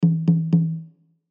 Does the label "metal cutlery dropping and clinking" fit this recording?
No